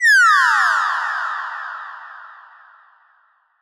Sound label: laser; bespoke - Floral v.1